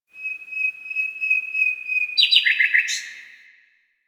Bird Nightingale; Warframe; Ambience; Nineteen Ninety Nine

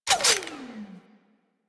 Emplacement Mech End; Grineer

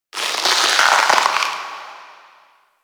Ice Close Ice Break Small; Ambience; Warframe; Corpus Ice Planet

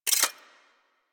Pistol Mode Switch Enter, weapons; Warframe; Thanotech

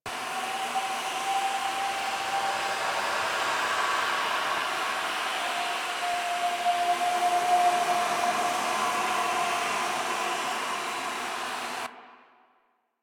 Gas Air Loop; Ambience; Warframe; Corpus Gas City

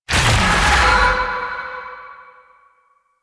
Crystal Growths Cast; Warframe; Citrine

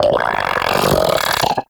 Arachnoid Vocal Attack; Enemies; Vocals; Warframe; Corpus; Arachnoid Hunger

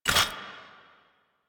Footstep Default; Warframe; Warframes; Hoplite

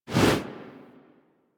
Generic Weapon Aim Transition In; Warframe; Weapons

